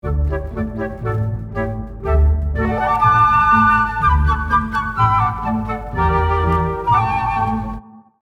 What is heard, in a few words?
Merlitons Flutes, The flutes are harmonized in three parts.; Rule of Three; The Nutcracker